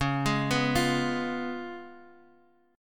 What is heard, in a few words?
Db 7th Db down Guitar Open G